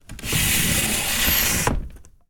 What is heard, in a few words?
opening sliding screen